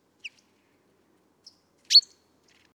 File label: cchaffin coelebs FC SC; eisfeld